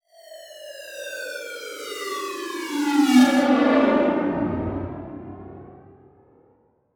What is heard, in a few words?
Title Transition Descend; Production Element Title Transition